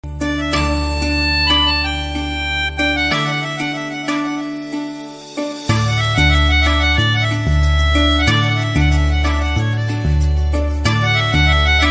marathi ringtone basuri flute new marathi ringtone; movie miss match